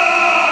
pain; svencoop; morass beta; de man

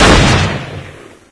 fire, guns taurus; svencoop; custom monsters

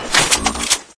pulsecannon draw; svencoop; hl2

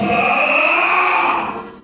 die; svencoop; morass beta; de man

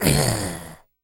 pain; svencoop; justzombies

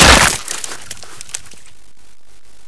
axegrab; monsters; svencoop; aomdc; davidbad

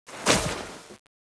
body drop; svencoop; sence; mistake coop

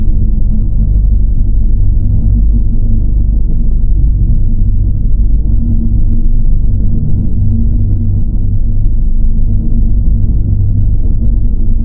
demon belly ambience; svencoop; source of life

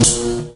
stunstick big hit; svencoop; hl2

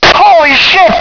osprey; svencoop; echoes; military

